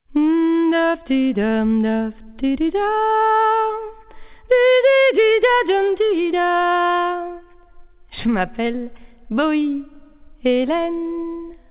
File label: vocal